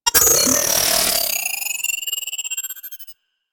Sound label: Capital Ship Target; Warframe; Corpus